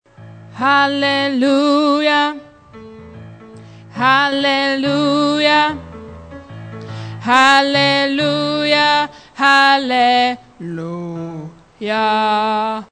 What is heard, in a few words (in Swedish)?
bas